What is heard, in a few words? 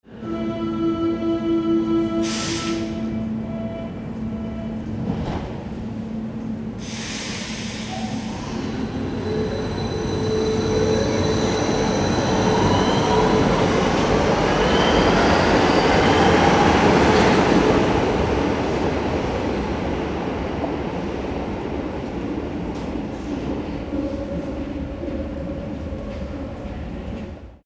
underground train leaving